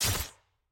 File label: aah; pyke